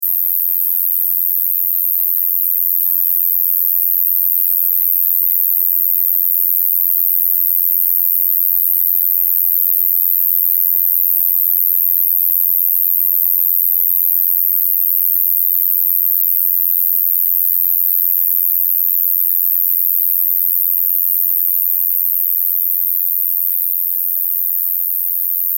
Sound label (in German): große Schiefkopfschrecke; heuschrecken